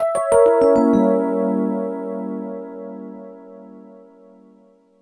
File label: EP, Keyboards, Electric Pianos